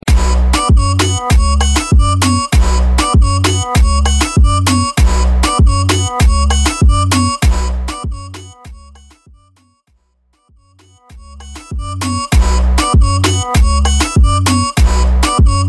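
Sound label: Hype Intro Extended Mix 98 Bpm; 2020 Mainstream VIP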